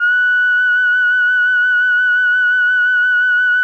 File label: F#5, SYNTH WAVES; Music Store Sound Service; Einstein